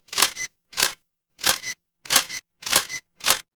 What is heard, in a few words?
office camera